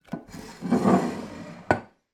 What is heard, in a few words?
household jar biscuit slide on kitchen worktop; zapsplat